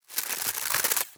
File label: plastic wrap; wrapper